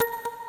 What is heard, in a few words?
crystal scatter; Lucky Fortune; Wazdan